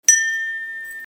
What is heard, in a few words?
fence bell